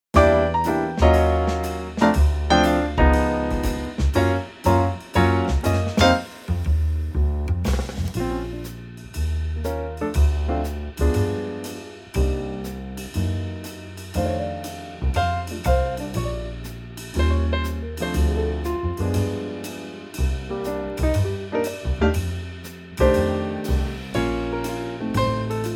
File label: Trio Bb swing